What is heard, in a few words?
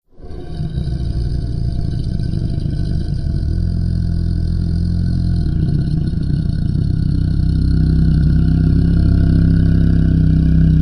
Monster Low Growl, monsters